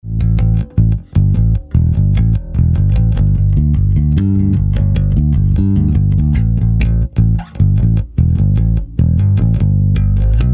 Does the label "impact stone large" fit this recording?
No